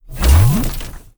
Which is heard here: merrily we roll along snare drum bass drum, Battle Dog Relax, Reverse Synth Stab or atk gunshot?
atk gunshot